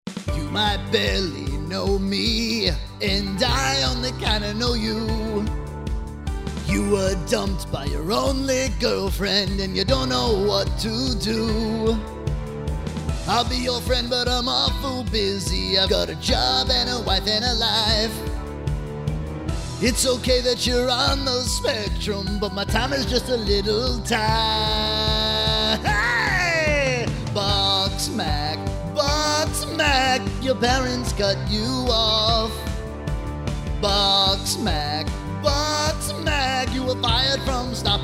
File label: Sitcom Theme with Vocals